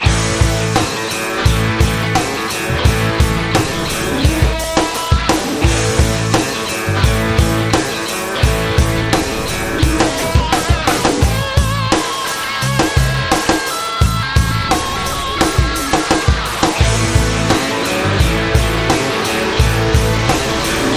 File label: Rusty Guitar